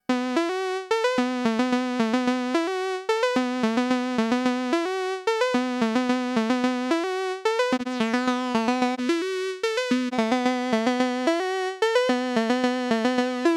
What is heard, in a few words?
NTS-1 mkII Lead Flanger